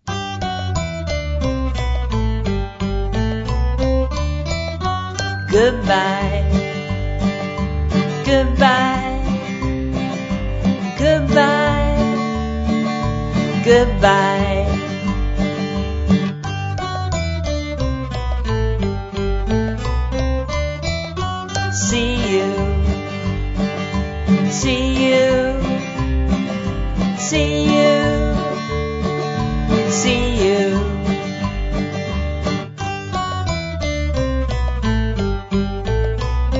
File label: Easy Goodbye Song For Children